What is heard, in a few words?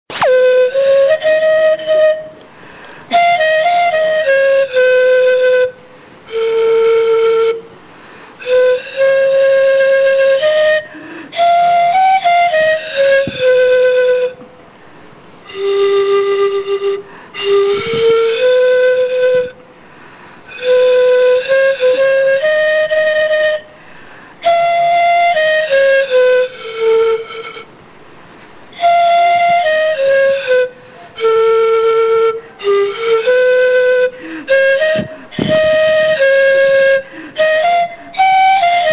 pan flute soler